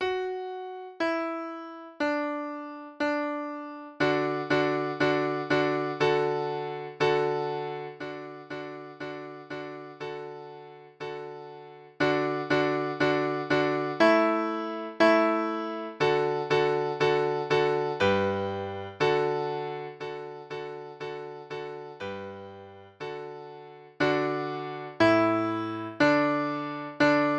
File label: spring baby piano